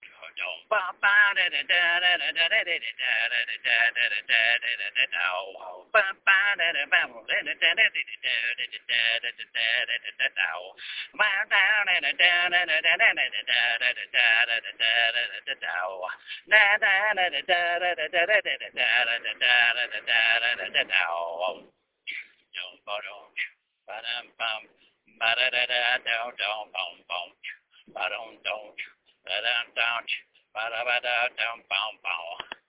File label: cadilac funk